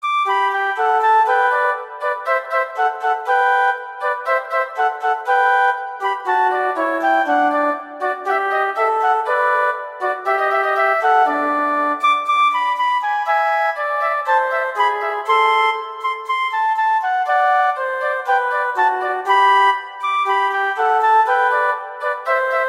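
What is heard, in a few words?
Duet, horn; Mozart; Duets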